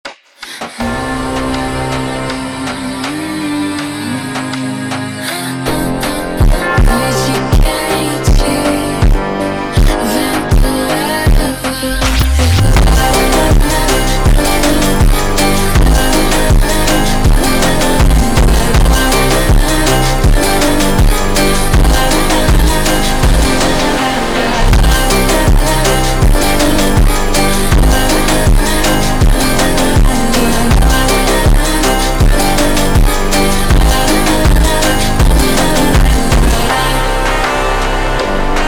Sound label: Funk, Super Slowed